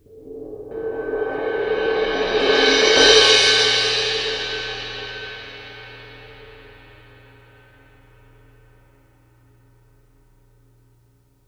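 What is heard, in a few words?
c suspended cymbal medium roll to crash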